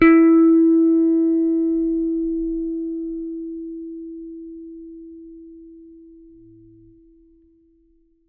T-BIRD BASS PICK E4; Bass Mace; DirectWave

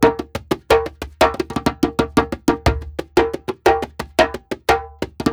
ETHNO PERCUSSION 90; Beats; ethno2

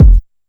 HOUSE BD; drum; BASSDRUMS

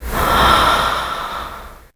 BREATHE

